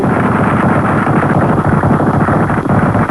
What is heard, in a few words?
DESTRUCT, Military